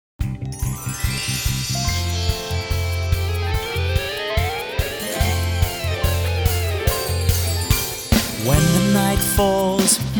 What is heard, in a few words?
sig tune; kids tv